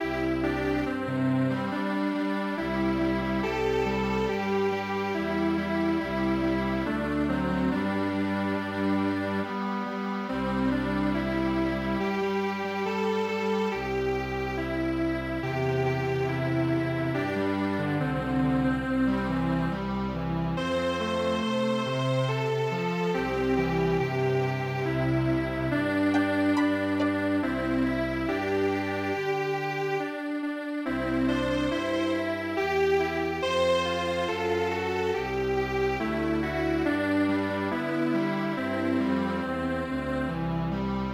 strings; koinobori

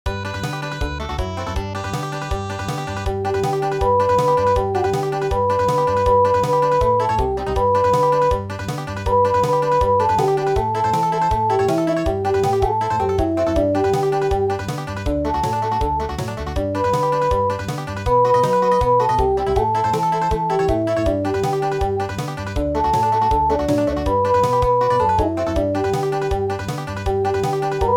Country, midi

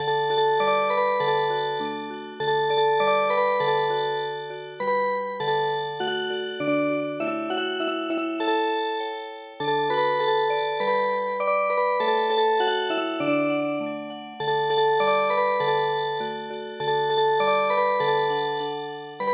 mamemaki Music Box; 日本伝統曲の「まめまき」に癒し系のアレンジを施したオルゴール曲; ミディアムテンポな4拍子の日本の伝統曲です; ＰＣ ＭＵＳＩＣＢＯＸ

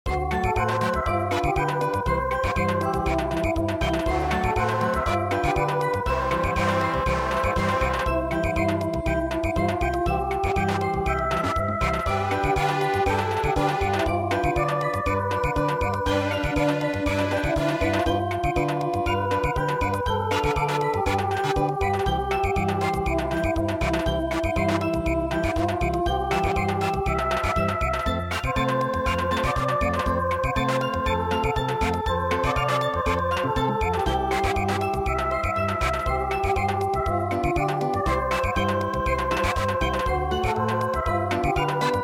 Samba, midi; HE ALOHA NO O HONOLULU

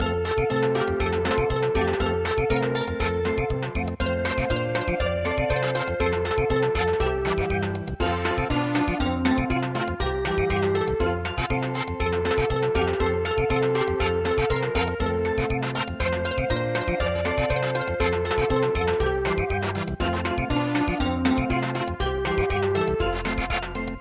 mountain monkey Samba

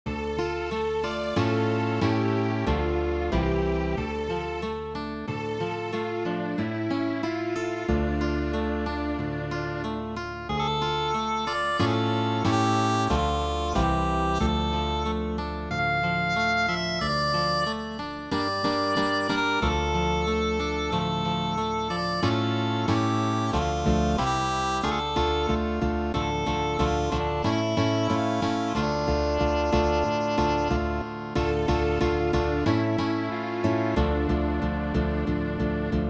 Old Gaelic Lullaby, midi

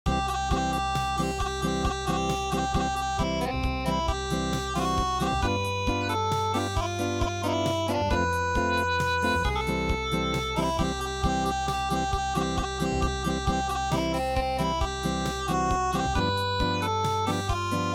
Unplugged, midi; Hail To Britannia

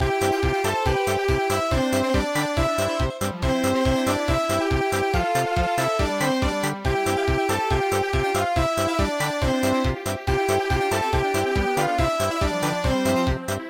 parade; omunewo harimasyo

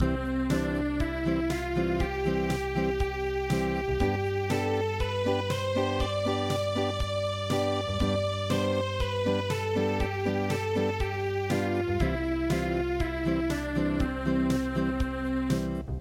Acoustic

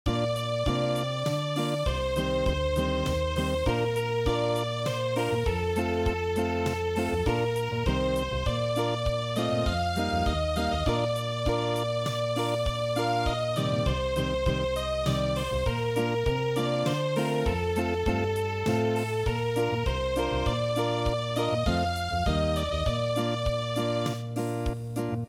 Unplugged, traditional; midi

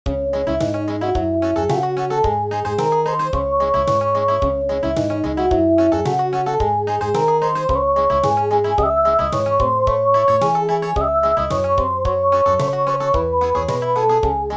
Country, traditional; The Frost

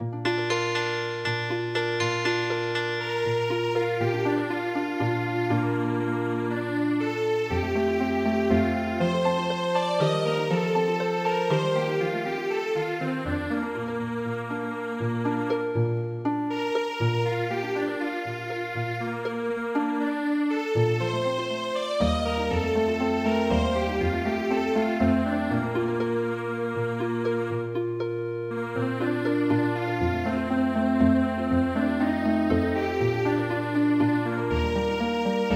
Serenata Healing Harp; midi; traditional

